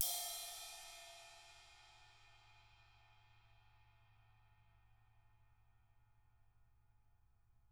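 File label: CRASH 18 TIP, CYMBAL; CYMBAL KITS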